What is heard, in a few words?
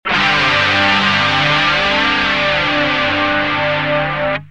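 guitar boss bf1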